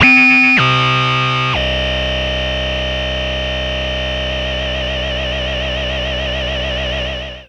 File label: electric, special FX twisted; USB Soundscan; AKAI